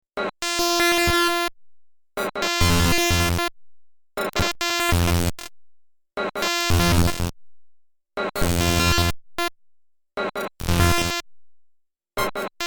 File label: Drum